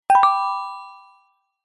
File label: multimedia notification mallet generic with slightly harsh resonating tone; zapsplat